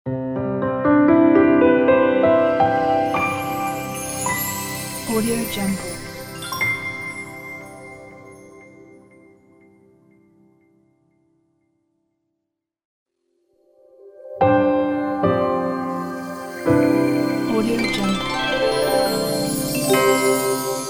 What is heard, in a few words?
elegant piano logo pack